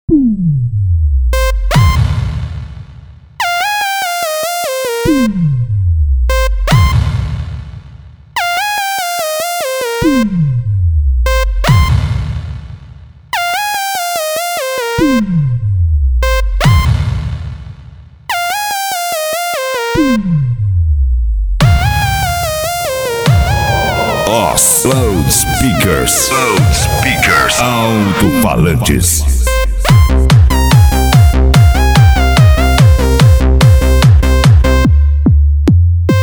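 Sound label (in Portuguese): alto falantes loudspeakers 5250 w agressive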